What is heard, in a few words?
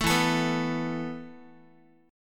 E Suspended 4th E, down, Guitar, 4A,4E,5A,5D,5G sharp,6C sharp; Esus4; strummed